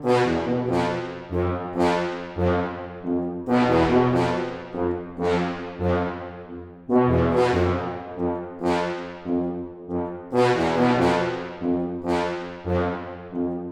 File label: tuba; туба